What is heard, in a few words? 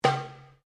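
Drum Darabuka Edge Slap mezzo forte, Egyptian Darabuka Drum; Drums; Musical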